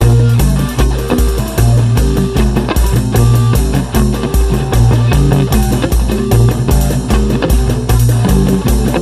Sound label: afrikarock; 50er